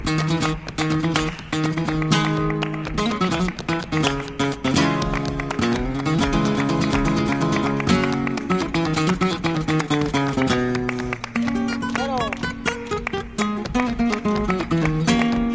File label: Falseta